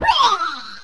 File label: Mantrap Die; Seer; GamigoNA